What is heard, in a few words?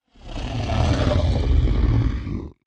Growl, ghosts & demons